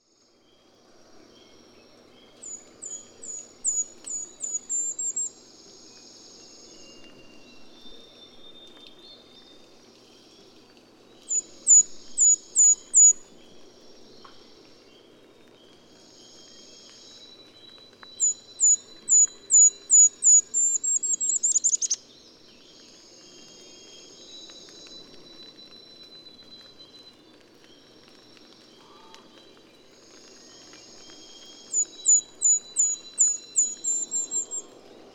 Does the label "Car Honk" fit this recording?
No